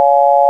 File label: sine; ものづくり基礎工学（情報工学分野）; 音の波形と分析